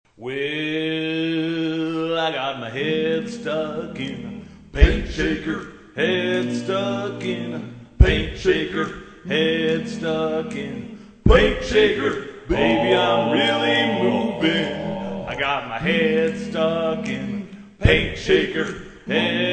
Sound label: Paint Shaker